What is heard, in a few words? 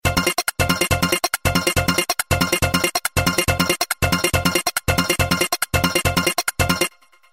Beat